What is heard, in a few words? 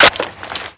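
weapon foley fal reload clip in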